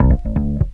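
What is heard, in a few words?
bass riff; INSTR LOP; E-Lab; X Static Goldmine 2; akai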